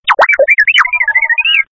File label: R2d2 Short Tone